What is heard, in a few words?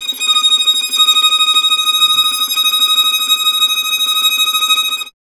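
string violin tremolo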